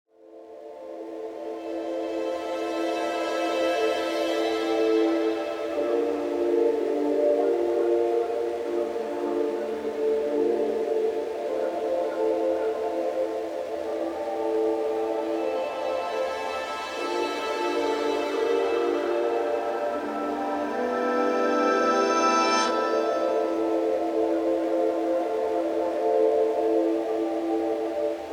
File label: Evening Chill Pads